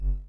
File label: heartbeat